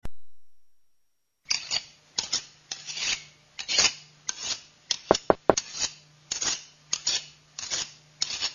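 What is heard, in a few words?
knife sound effects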